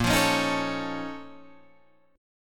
Bb Minor Major 11th Bb, Guitar, Drop D

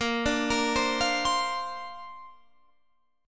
A sharp Major Add 9th, Guitar Standard; arpeggiated

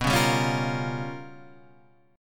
G 7th Sharp 9th Flat 5th

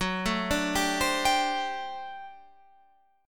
G Major 7th Suspended 2nd Suspended 4th F sharp

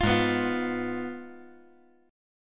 G sharp Minor 6th G sharp down; G#m6 strummed; JGuitar

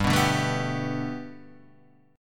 B Minor 7th Sharp 5th G, chord

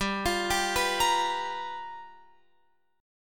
G 7th Sharp 9th G chord, Guitar Open F; G7#9 arpeggiated

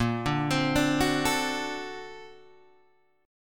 A sharp Augmented Major 9th Guitar Open G

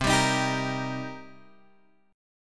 C Minor 7th, Guitar, Open G; down; chord shape

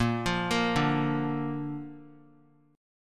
A sharp Major; arpeggiated; JGuitar

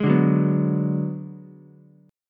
G sharp Minor 7th Flat 5th G sharp down Ukulele; G#m7b5 strummed; JGuitar

